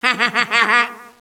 hah hah hah hah hah, Echo inside caves, underground; DS; ML BOWSERS INSIDE; Luigi